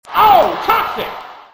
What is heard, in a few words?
ohhh